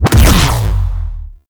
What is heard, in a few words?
Mech Sword Heavy Charge Projectile; Nechro